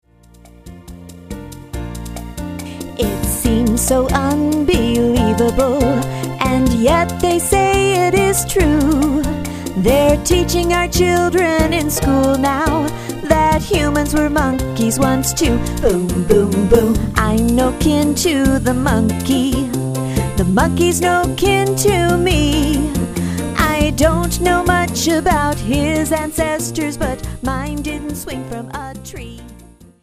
Monkey Song

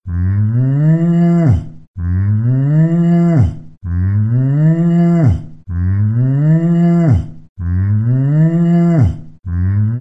Sound of Cow

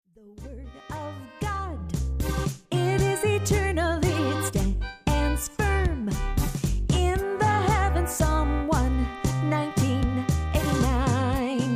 Eternal Tango

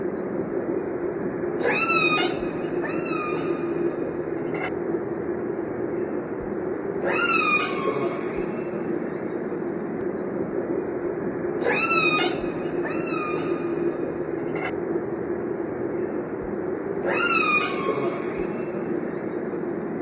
Sound of Orcas

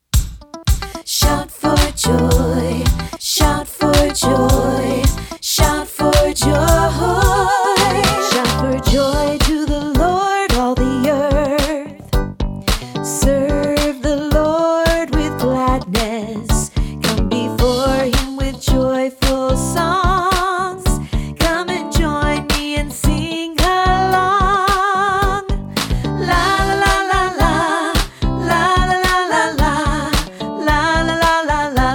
Joyful Songs

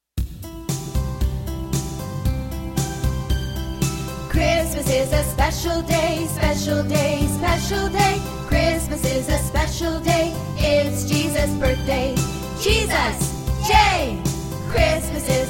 Song

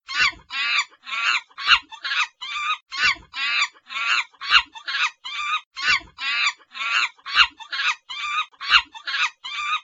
Sound of Monkeys

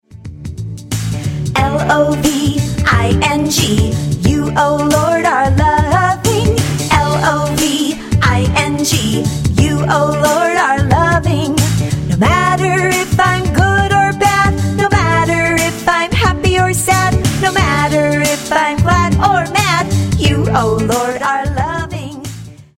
Song LOVING

